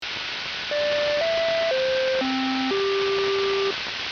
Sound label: Melody